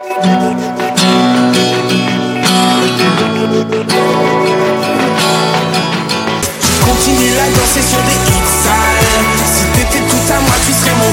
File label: cool lovely guitar english song tone ringtone